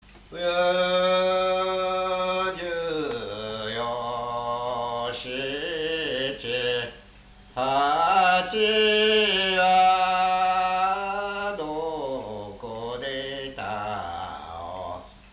taueuta, minyou; 横芝光町; 木戸; 労作歌; 田植歌

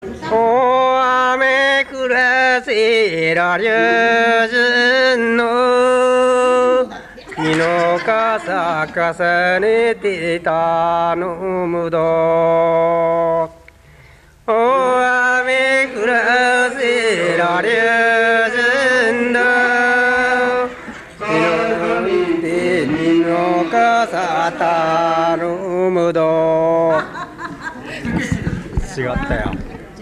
amagoiuta, minyou; 長南町; 雨乞歌

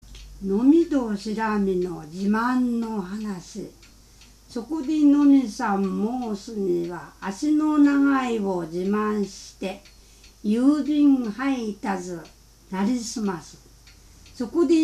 sumo jinku; 角力甚句（続）; 匝瑳市

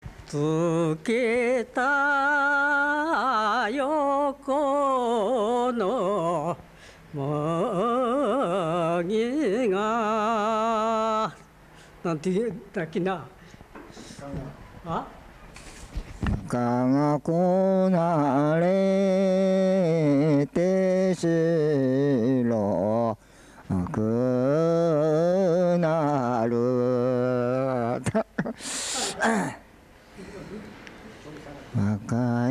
mugitukiuta, minyou

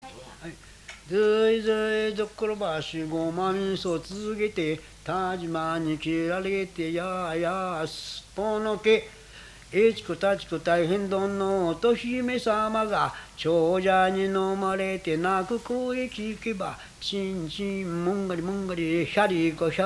itikutatiku, minyou; 袖ケ浦市; いっちくたっちく

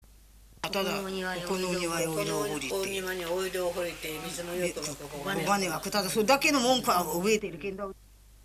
niwauta

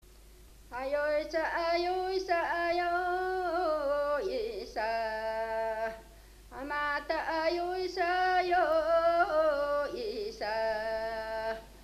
meduki uta, minyou

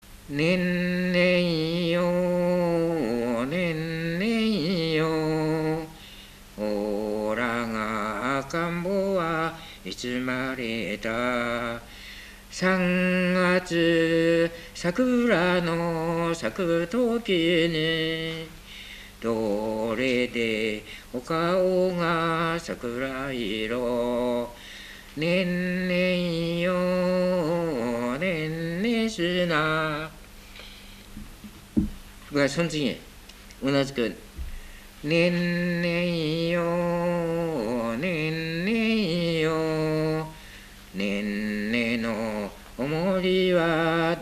warabeuta

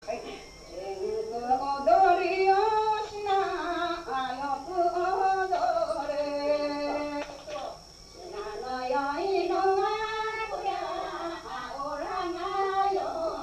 jinkuodori; 習志野市; 甚句おどり

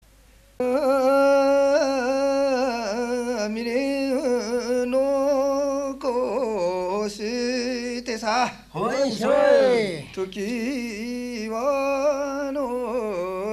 jinku; 鴨川市; 甚句

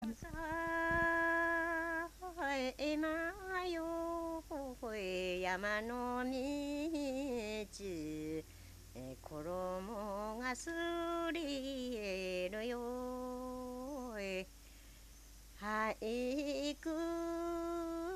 bonodoriuta, minyou

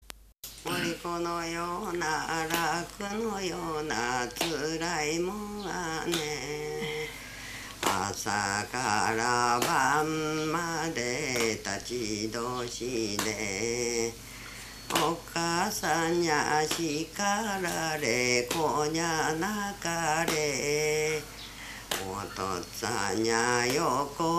morikouta, minyou

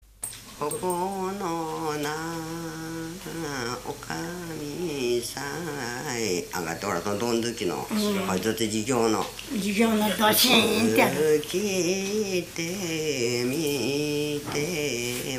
donduki

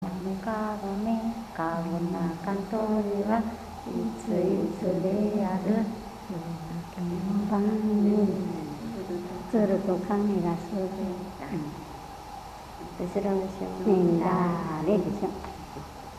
kagomekagome; 一宮町; 遊戯歌; かごめかごめ

